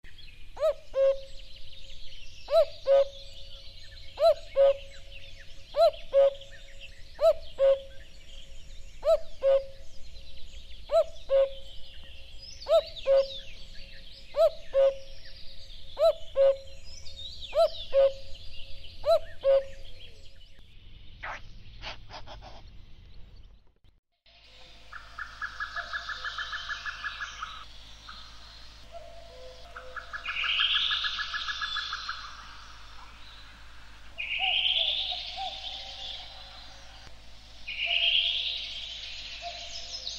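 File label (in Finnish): kaki, lintuäänet; Tavallisin ääni on tuttu ”kuk-kuu”, jota toistelee muutamasta muutamaan kymmeneen kertaan peräkkäin; birdlife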